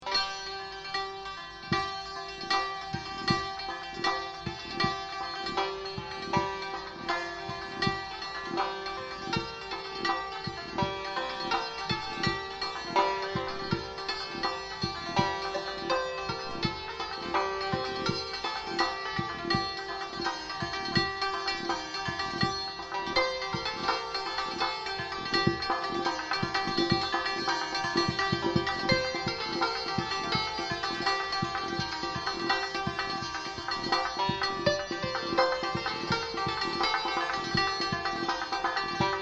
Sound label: Santoor Solo, Persian